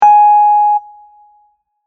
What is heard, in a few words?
G2 sharp, Keyboard notes